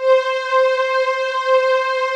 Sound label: ANALOG STR; Pro-Rec - Synthsonic; TRINITY